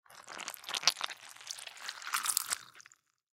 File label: Surgery Squishes, Surgery Squishes Guts; Emergency